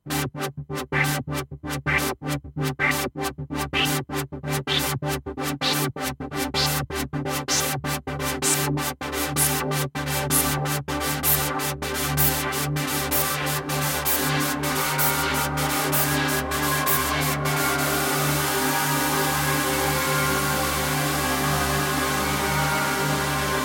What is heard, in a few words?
Motion Filter